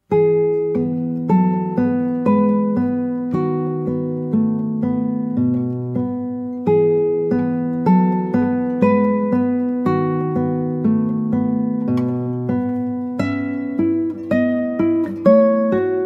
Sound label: Solo Guitar